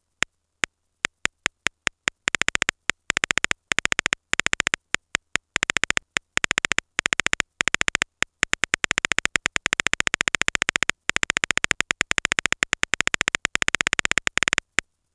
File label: threes, snares